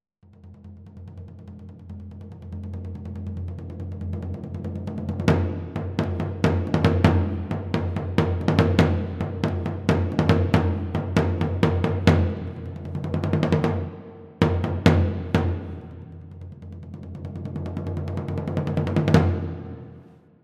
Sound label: Kettle Drum